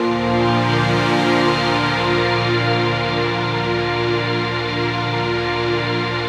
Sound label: PAD, Dance Mega Synth